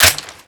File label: reload chamber, foley; ranger